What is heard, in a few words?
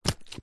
footstep single shoe female boot leather buckle wet mud